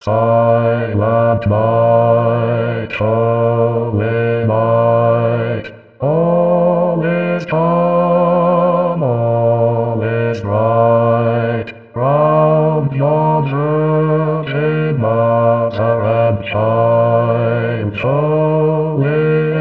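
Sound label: Silent night Bass; Reinecke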